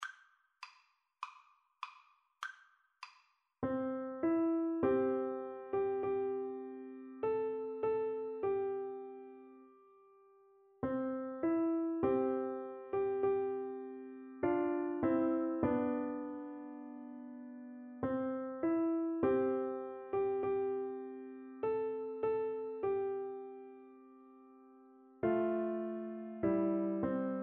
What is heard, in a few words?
Piano Duet